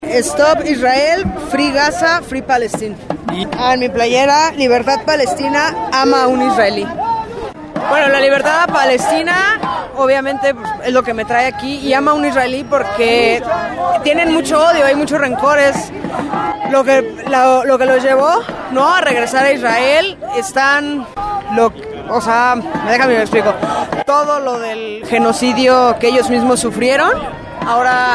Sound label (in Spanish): marcha; palestina julio 2014